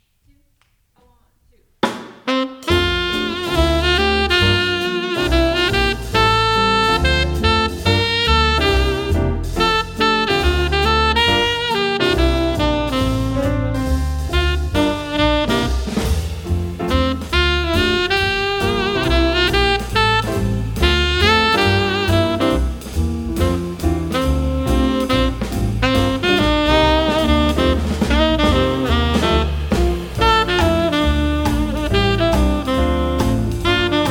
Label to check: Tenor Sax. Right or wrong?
Right